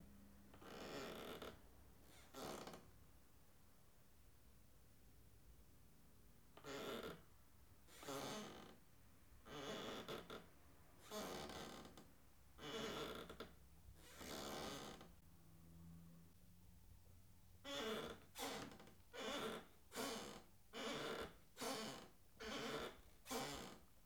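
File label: Rocking Chair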